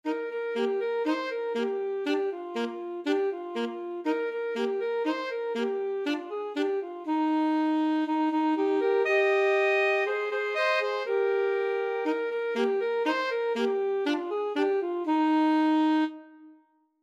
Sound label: alto saxophone duet